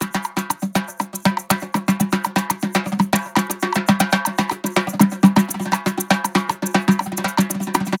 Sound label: percgroove 120, Perc Grooves; Slate; SD SEISMIC Drum Sample Pack; Signature Perc Grooves